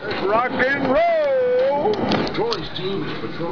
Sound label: Swamp Rock And Roll